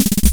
Snare Roll, Hammond Auto-Vari 64 drum machine; 300 Drum Machines; Processed

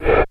HHH, drum; Crypton - DrumBase5000; WS DRUMS

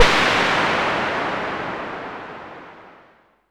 Synare 3 Raw, White Noise Raw; noise hiss crackle

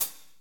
HAT, Drumscapes Roland; Northstar - Drumscapes Roland

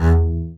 SPICCATO, ARCO BASS; Northstar - New Gold VOL-2

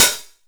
Bass Open Hat, Jomox Airbase99; Drum Machines

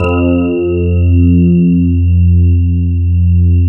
F1 DPF

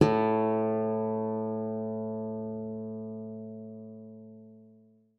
GUITAR A2; Xsample VOL-2

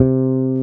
Basses, Roland

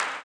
CLAP, blues & soul; ILIO; Vocal Planet

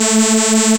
saw lead synth

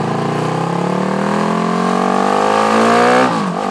MOTOS; East West - Global Sounds

